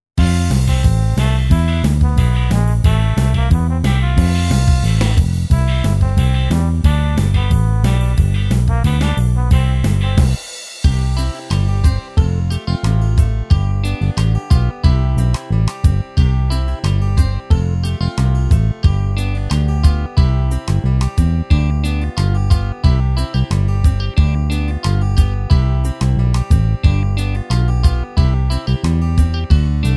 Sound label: Karaoke